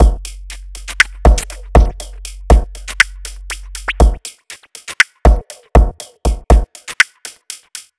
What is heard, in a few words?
Hop Beat, Drum Loop; Blade Hop Beat; Sonic Foundry (Sony Creative Software); The Electronic Music Manuscript Collection; Drum Loop Structures